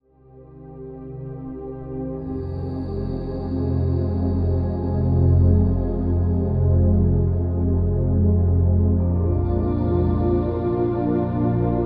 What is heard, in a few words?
Beautiful Relaxing Music for Stress Relief Meditation Music Sleep Music Ambient Study Music